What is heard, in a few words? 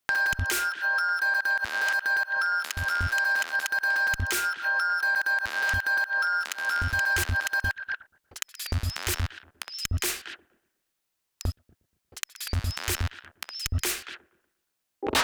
hood dogs, Loops; Polyend; Loops n trouble